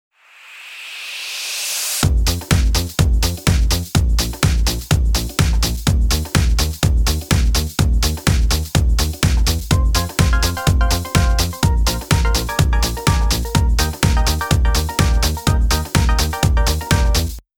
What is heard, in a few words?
bass house